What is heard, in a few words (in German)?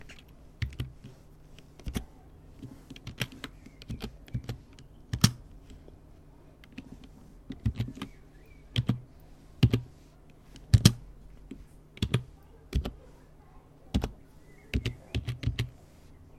tippen